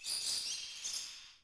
inv squeaks; Thief II; invman

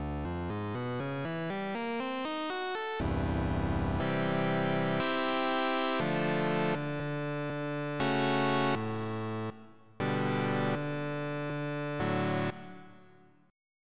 Lead 2 (sawtooth); FF7

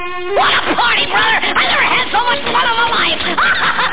happy birthday default; hapybday

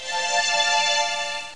key; Museum of Anything Goes

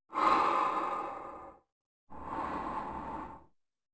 breathing; SGI O2 Out of Box Experience 2.2; cyber anatomy

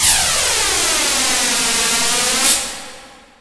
wave; Earth and Beyond; EA Games

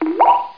drop

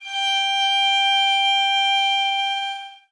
Violin Soft G4; CM Strings

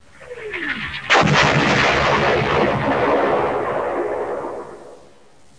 explosion; PC Gamer IT CD 35

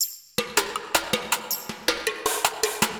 DRUMLOOP AMBNT 160; Ambient

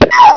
SPLAT; Death from Above

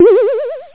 ball; CD Action

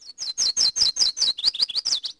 bird; DAoC; Toa

